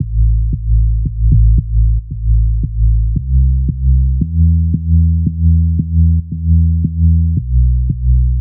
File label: tonal loop bass 114 Amin; i know